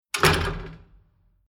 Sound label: Apartment Old Lift Elevator Outer Metal Door Closed Impact Reverb; Tom Hutchings